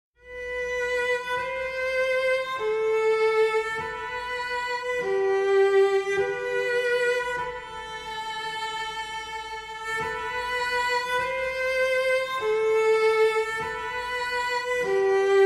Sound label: Melody, Lullaby; star trek